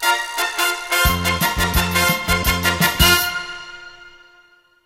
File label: Jingle; Dragon Ball Zenkai Battle